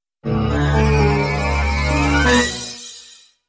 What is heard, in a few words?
Message Text